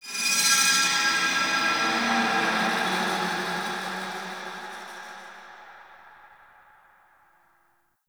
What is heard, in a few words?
ambient texture; Warframe; The War Within